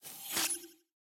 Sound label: map opponent complete